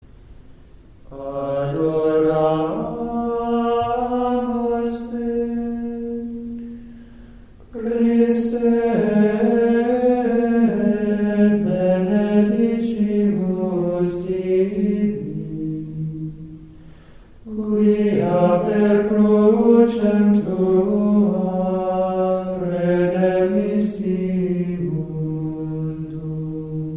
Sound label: Adoramus te Christe; Good Friday